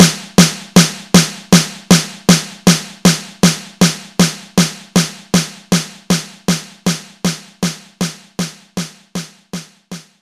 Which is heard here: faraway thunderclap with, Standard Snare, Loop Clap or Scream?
Standard Snare